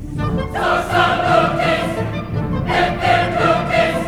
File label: singing